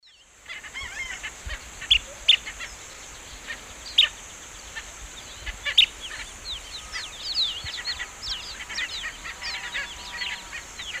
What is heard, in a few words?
Charadrius collaris, calls of pair; S. America; Eastern Lowlands